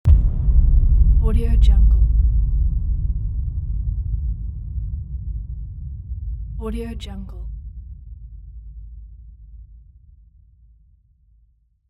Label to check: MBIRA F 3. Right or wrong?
Wrong